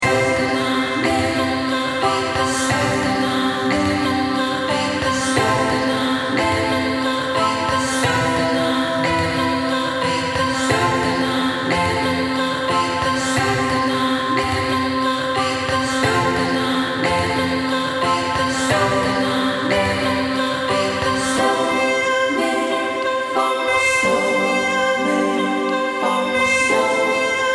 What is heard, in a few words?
Healing Melody